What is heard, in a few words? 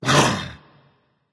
grunt hit berserker; cuchulainn